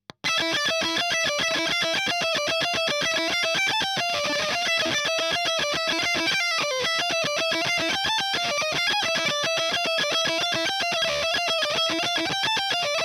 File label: EL Fuzz End